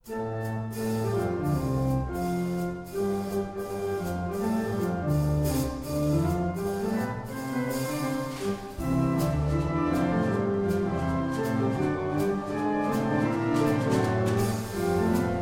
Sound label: Fugue; Little Mountain